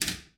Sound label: Pinball Bumper